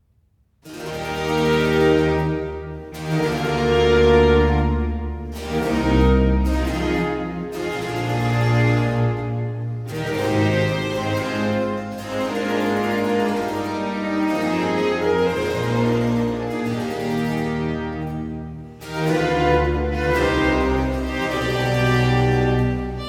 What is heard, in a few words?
Concerto 9 in F major 1. Preludio, largo; Arcangelo Corelli; Corelli - Complete Works; Klassika